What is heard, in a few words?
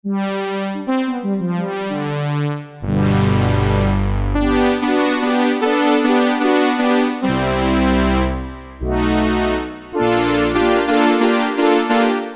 Synth Brass; Cheetah MS6